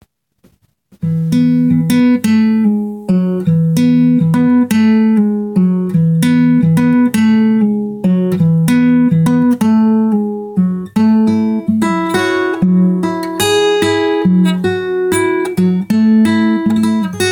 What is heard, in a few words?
romantic guitar plucking